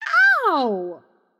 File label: nv ouch; smite; voicepack; morgan le fay